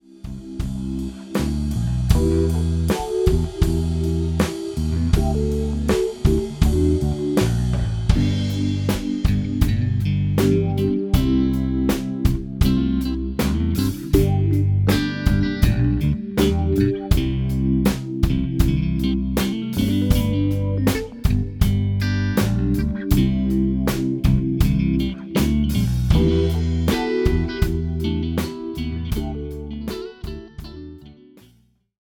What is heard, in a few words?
Groove E902, bassdrum; mikros; Mit Groove